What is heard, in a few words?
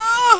zombie female pain